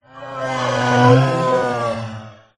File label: horror evil demonic growl groan